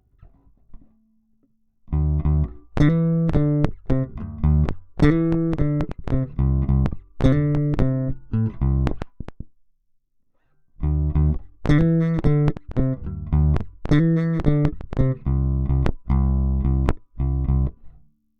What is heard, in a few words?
Hi-Fi DI